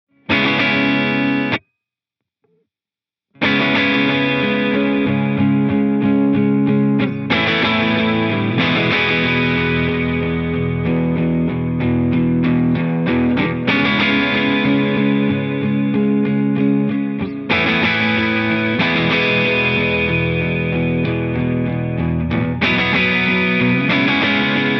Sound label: Tape Echoplex